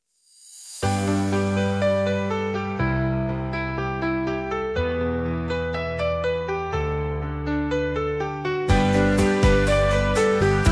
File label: instrumental